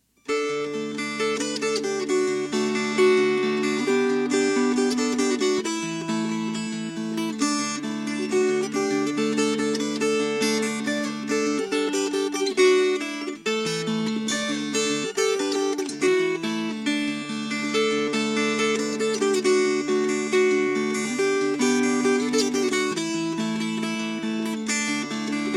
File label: Fingerpicked